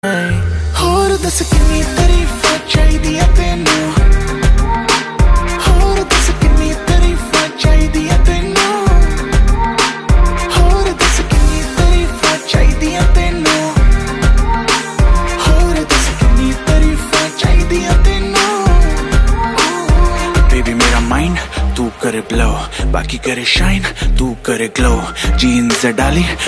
bollywood ringtone

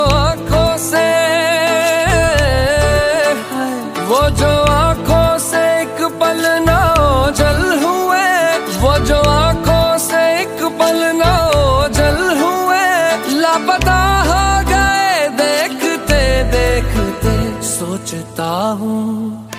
sad bollywood song ringtone

